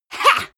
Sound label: female attack